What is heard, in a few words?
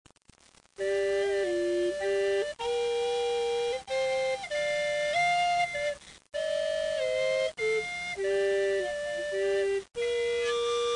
recorder